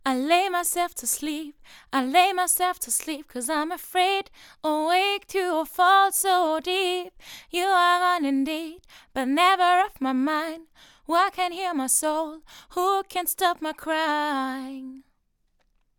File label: vocals, Rode NT1000